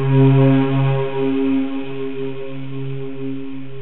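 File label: grand piano; jazz jackrabbit 1; M8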